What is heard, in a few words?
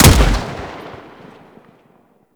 Spark Rifle Shot; Warframe; Grineer